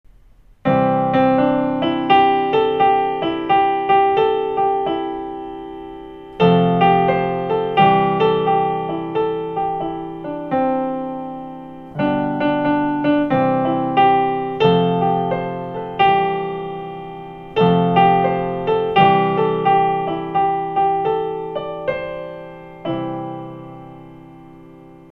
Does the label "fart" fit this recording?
No